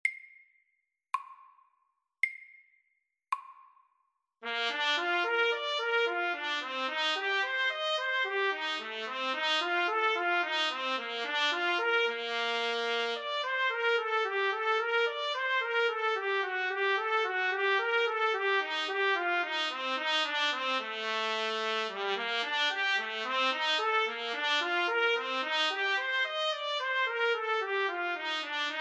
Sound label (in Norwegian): Arpeggio etude SAKTE